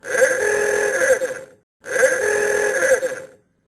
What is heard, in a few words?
Nerd Car Horn; Soundpad